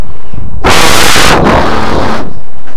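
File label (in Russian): яростное нападение мелких монстров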